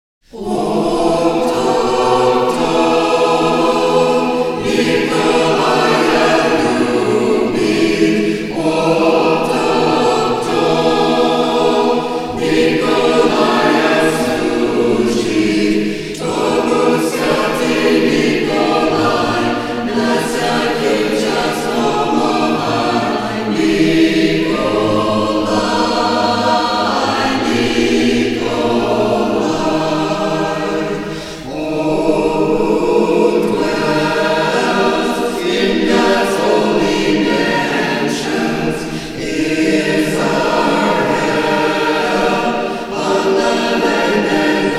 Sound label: hymn to st nicholas